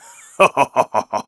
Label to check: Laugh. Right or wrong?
Right